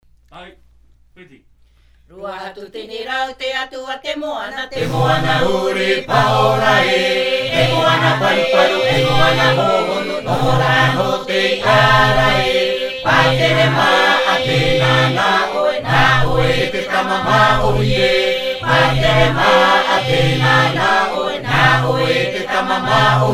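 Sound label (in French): Himene tarava Raromatai, chants polyphoniques maohi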